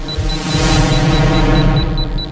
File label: aim start